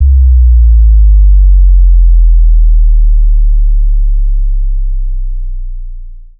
Sub Drop Clean; Riddim; One-Shots; SFX; Ghosthack; Riddim Essentials Volume 2

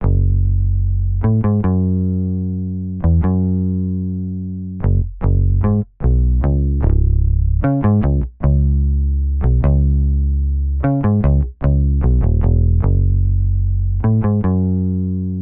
150 BPM C# Min Bass Guitar; Cymatics; Code Of Honor

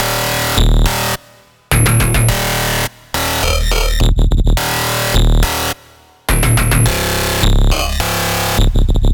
Bass Loop D#m 105BPM

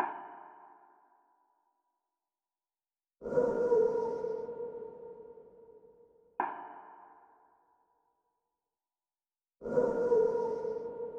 150 BPM C Min Accent; RnB; Loop Stems; Cymatics; 2022 Melody Collection; RnB Pt 2; Love You So

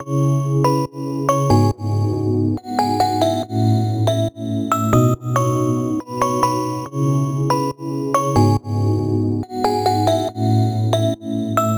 Future Bass Chord Loop - 140 BPM C# Min; Synths; Loops; Cymatics; EDM Starter Pack

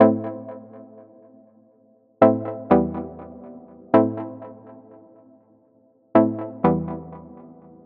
Dub Stab Loop Am, Deep House; 122; Techno; Ghosthack; AC2023 - Day 17 - Dub Techno & Deep House Chords

